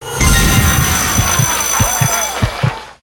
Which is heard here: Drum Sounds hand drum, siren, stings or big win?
big win